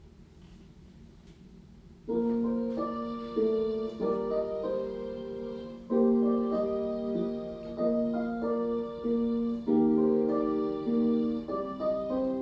Kyrie Alt

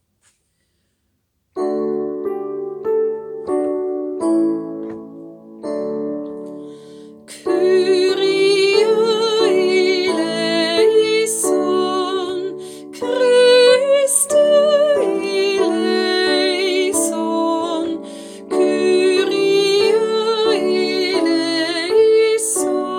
Kyrie eleison Melodie; Rundfunk 2020

